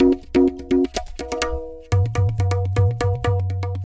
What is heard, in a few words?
Tabla, 125 BPM; Zero-G; Pure Tabla